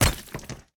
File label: pillar Hit